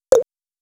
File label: cowbell; acapella